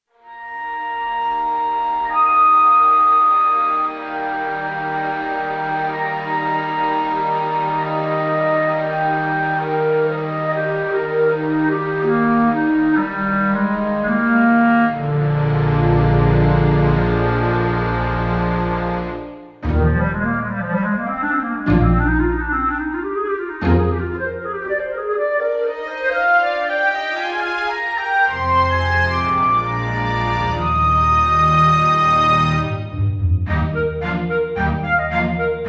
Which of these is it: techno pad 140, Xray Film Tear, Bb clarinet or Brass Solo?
Bb clarinet